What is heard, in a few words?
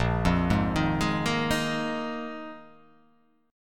A sharp 7th Suspended 4th A sharp